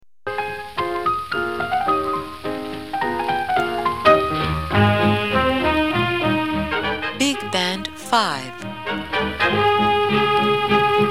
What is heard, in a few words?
bigband